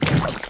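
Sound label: Crab Attack; Seer; GamigoNA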